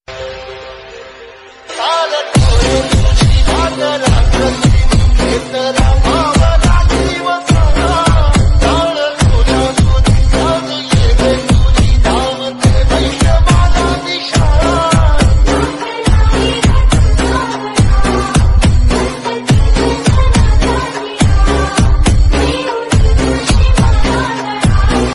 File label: marathi ringtone; vithal mauli